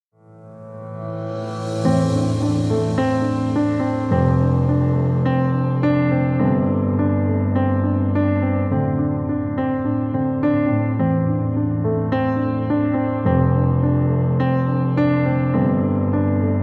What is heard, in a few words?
ambient piano